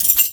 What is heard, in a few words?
key; cdogs